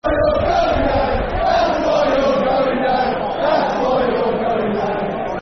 That's Why You're Going Down Football Chants (Soccer Song); Birmingham City Bcfc